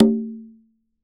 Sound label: CNG G O E, congas tuned; Q Up Arts; Denny Jaeger Private Collection